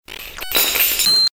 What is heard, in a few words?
Electrobreak; Best Of N2O Library; Breakers & Transitioners